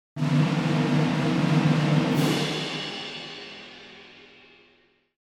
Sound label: Cartoon comedy drum fill